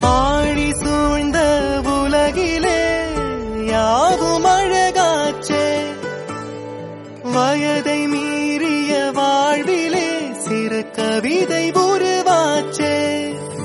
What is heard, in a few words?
Tamil Song